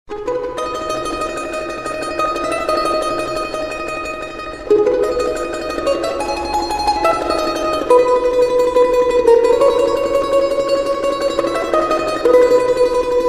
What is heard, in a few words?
balalaika